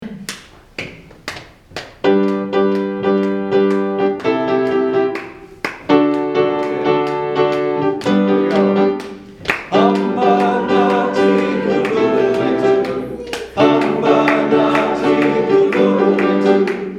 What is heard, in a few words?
Hymn; Hamba Nathi (Come, Walk with Us)